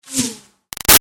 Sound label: Arrow By Zip; Bows and Arrows; Sound Effects:Combat; Big Room Sound